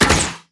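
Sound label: archer bows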